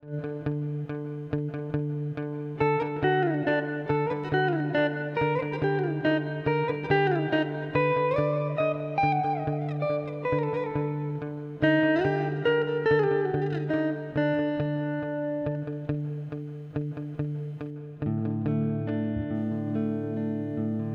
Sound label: Symphony in Raga Gayakapriya